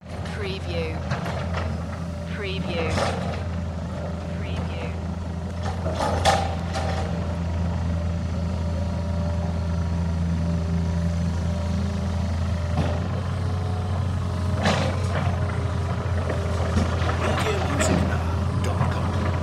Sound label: Digger